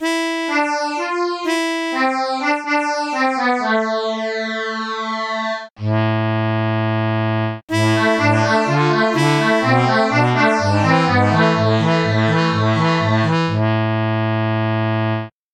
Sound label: bandoneon